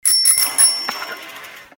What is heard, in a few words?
start